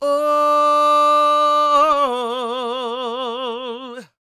CROON, Soul; Spectrasonics; Vocal Planet; Blues; CW SOUL

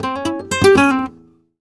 Flamenco Guitar Remates De Pulgar, c

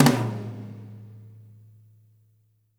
TOM 2L L; TOMS; Spectrasonics; Burning Grooves; BG TOMS

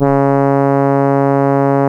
C#, Synclav FM, BRASS; Big Fish Audio

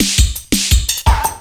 LOOP SD, Drum & Bass, 170 BPM

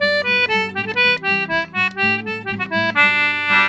G C1, Celtic Flavours; USB Soundscan vol.42; AKAI

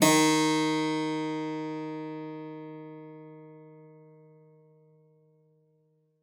SPIN D3, SPINET; Xsample VOL-7

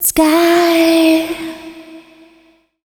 Dance Vocals, FEMALE

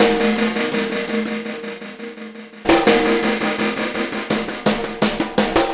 Snare Particle, Processed Drumkits; Zero Gravity Beats

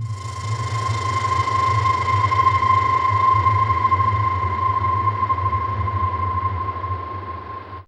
spaceopher, atmos; AMG - Vince Clarke Lucky Bastard

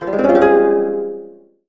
KOTO GLISS G#2; AKAI S-Series CD-ROM Sound Library VOL-7

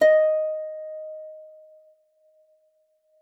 D5, harp forte; Xsample VOL-8

